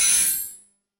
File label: gem polish; Crafting